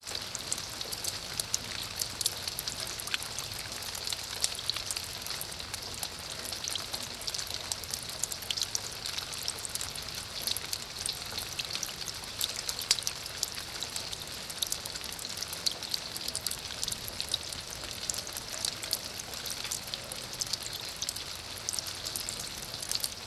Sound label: Rain Rain Falls into Water Constant Rain